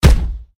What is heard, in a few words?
hit body heavy